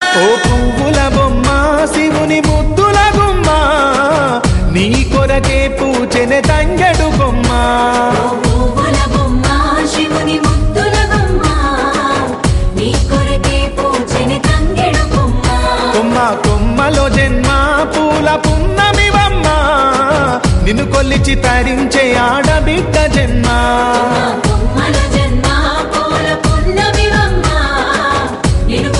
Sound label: song telugu bathukamma song